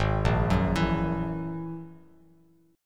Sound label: Ab 7th Suspended 2nd Sharp 5th down Bass Standard; Ab7sus2#5; arpeggiated; chordshape; JGuitar